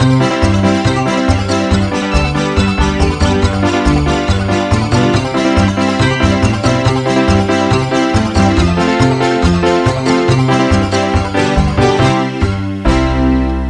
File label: Kids Music